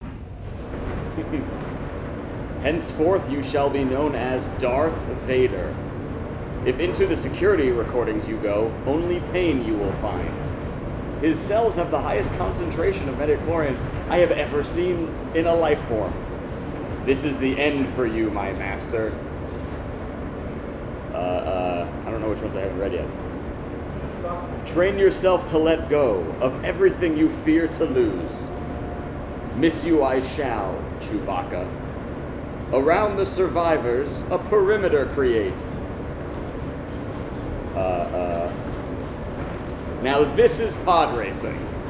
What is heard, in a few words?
Train Boom; cedar-demo